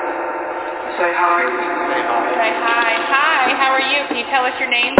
Phasma; Second Floor; martinsburg roundhouse investigation

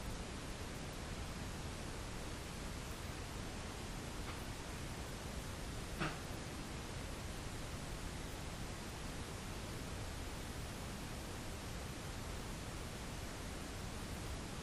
Tattoo Shop Static

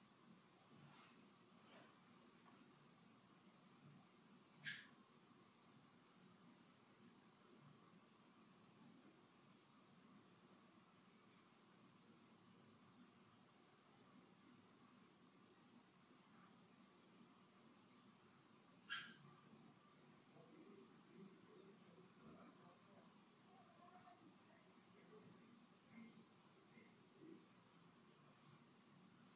Empty Room

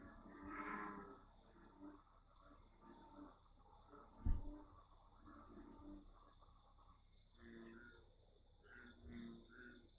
Ticket Booth EVP

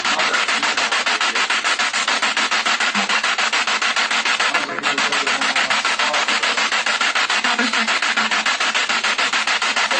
Kitchen Spirit Box

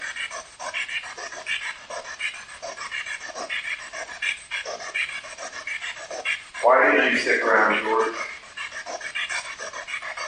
Bedroom Spirit Box

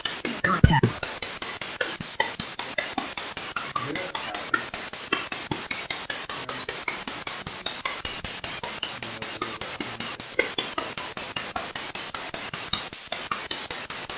Train Station; Mars Train Station – Return Investigation 2024

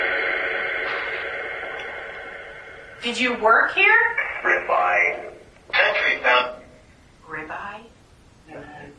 Phasma Box; 2nd Fl Eva Room; gill house investigation

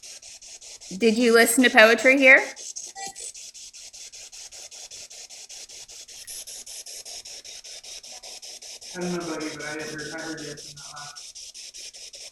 Ballroom Spirit Box

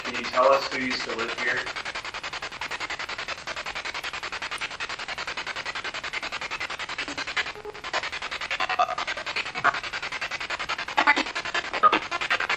Spirit Box; 2nd Floor Hallway

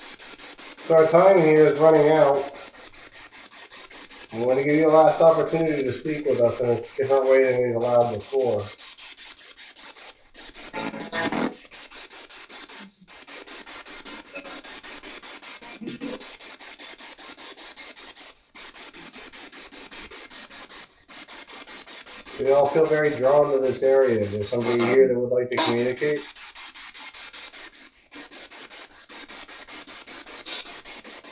Walking to Vestibule Spirit Box

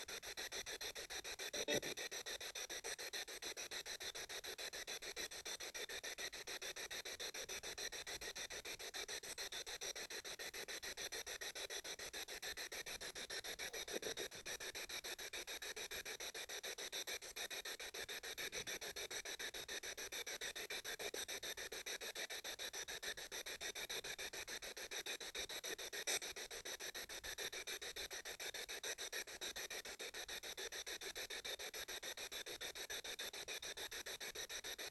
Spirit Box; american renaissance church investigation; At :48, amidst nothing but the muffle of the Spirit Box, a soft "Go" was heard.

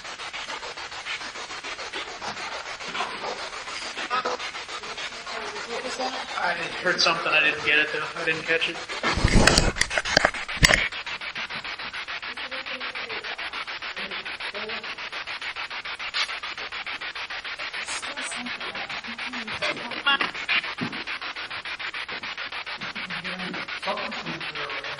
Attic Rec Area Asylum Spirit Box; madison seminary investigation

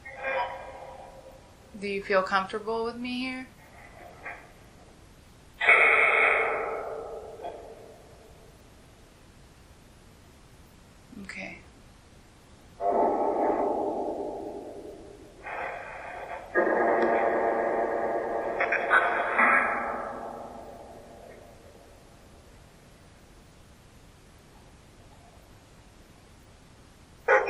Phasma Box; Canopy Room

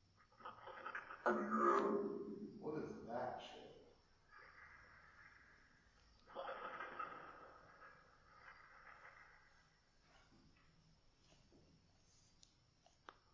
Ballroom Phasma Box

